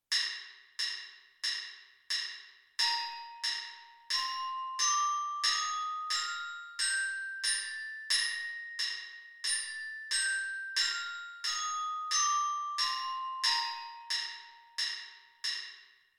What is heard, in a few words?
b flat major scale orchestra bells